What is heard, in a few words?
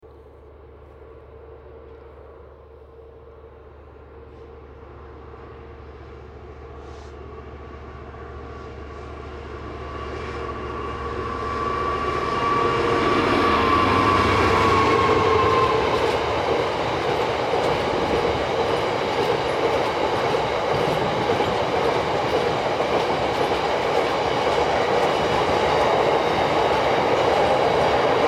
Passing Train